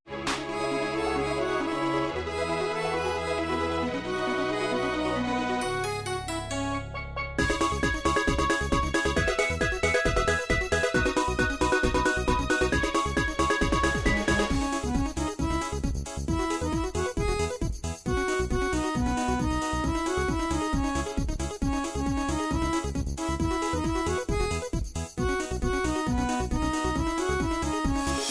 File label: trance, midi